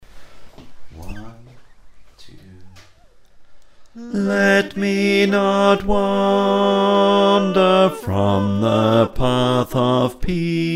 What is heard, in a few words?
bass, choir